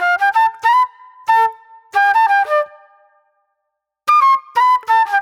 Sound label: J-Funk Flute, Call And Response